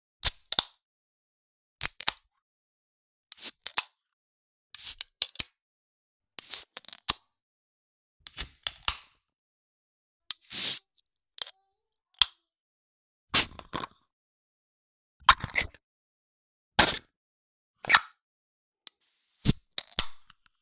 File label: Foley Cans bottles Open